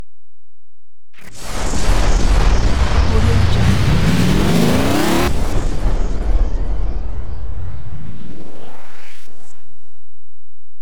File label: time exit warping layer